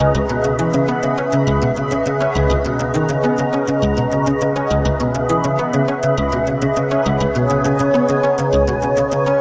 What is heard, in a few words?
102 pretty melody, synth and electronic loops; melodic loops